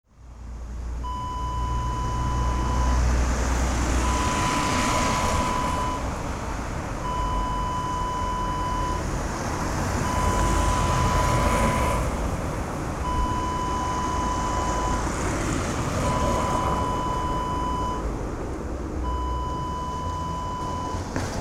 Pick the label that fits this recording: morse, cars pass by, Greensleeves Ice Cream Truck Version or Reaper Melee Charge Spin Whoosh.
cars pass by